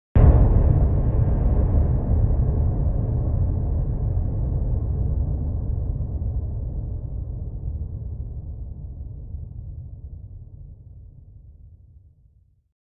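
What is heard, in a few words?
Impact Metallic Clang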